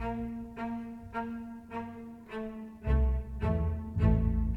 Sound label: Classical Bass & Cello; Orchestral; Sonic Foundry